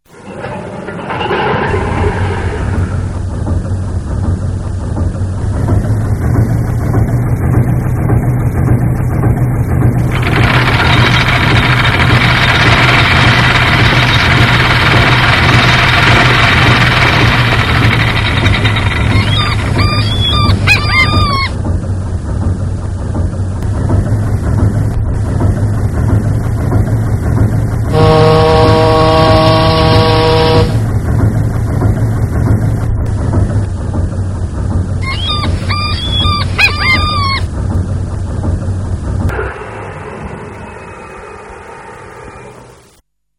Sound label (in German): Schiff Binnenschiff, modellbau; Soundbeispiele